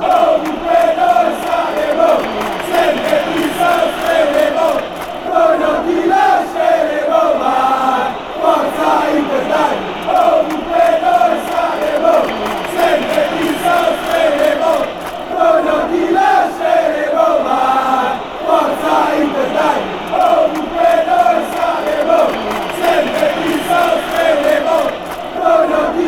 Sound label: fan chants, denmark